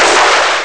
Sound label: shot; medusa; Amiga Soundtracker Sample Packs